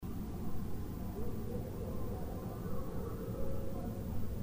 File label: barred owl